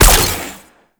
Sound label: Weapon Fire; Enemies; Sentient Dolicholyst